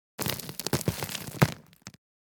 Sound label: Dirt and debris fall small